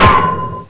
lead pipe hit; weapons; vendetta

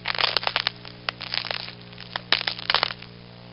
ice crac

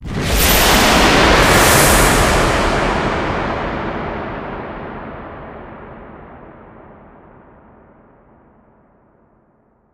distant angel shoot; st mission; hs c4

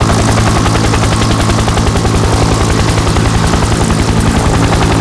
rotor; svencontra2; stage1

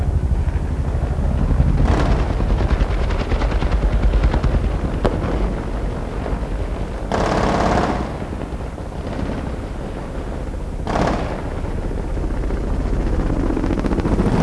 battle, ambience; vendetta

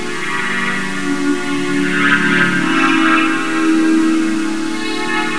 trippy loop; duke

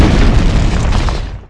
wall destroy; alienshooter

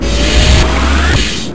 door ship; duke

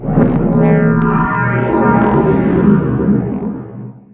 spool zap; vendetta

